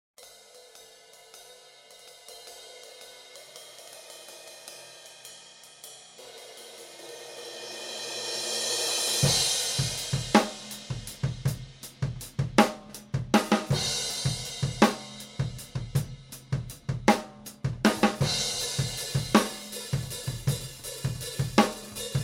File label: Drum Overheads SM58